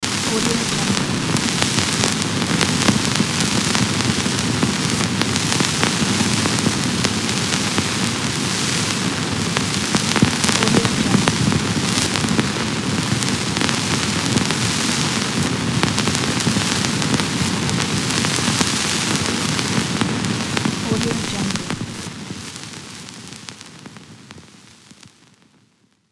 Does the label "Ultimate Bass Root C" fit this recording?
No